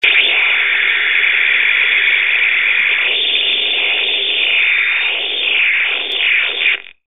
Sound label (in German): SNT EMV audio